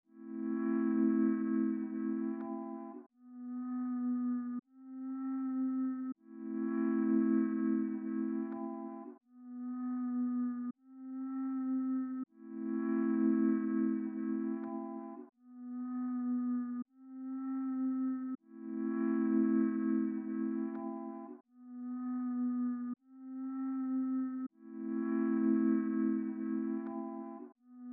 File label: 157 BPM A Min Flutes, Loop Stems; Melodies; Melody Mystery Expansion; Frost